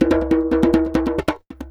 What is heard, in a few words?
Djembe loop 140 BPM; percussion; musicradar